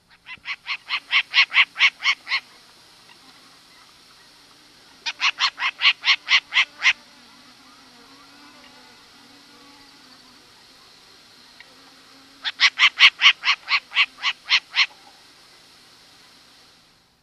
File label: short eared owl; owls